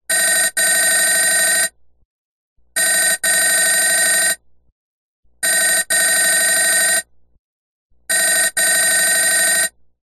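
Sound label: Telephone 4 Rings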